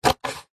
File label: office scissors single cut card tube